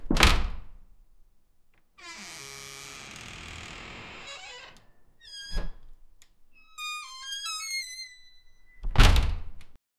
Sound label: a sharp creak when openin